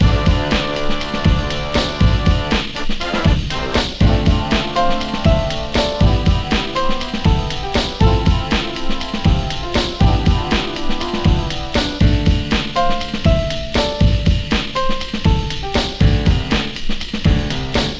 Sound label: la big; magix